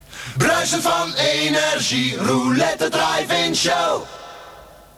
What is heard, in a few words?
silence